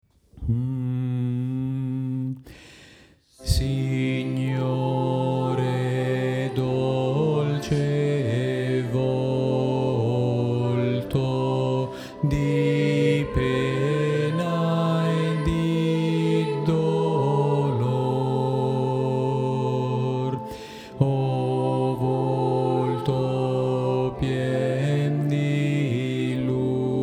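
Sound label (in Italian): contralti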